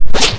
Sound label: skin pull